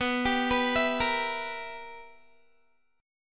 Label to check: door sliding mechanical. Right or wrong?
Wrong